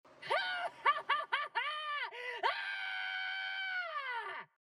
ahahahahaahaaaaaa

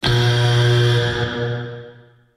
buzzer

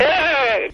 eeeh

